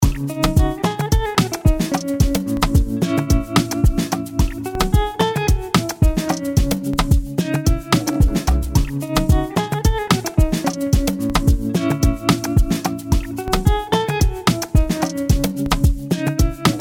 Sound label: Sample, African guitar samples